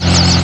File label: chopper; KNEX